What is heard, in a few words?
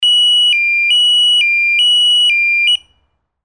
police bicycle siren